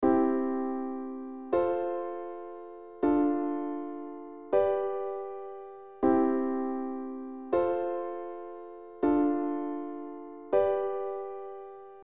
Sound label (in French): I IV IIm V